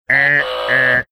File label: making alert sounds